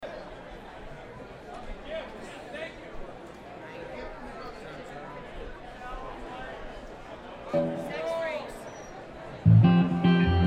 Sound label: Tuning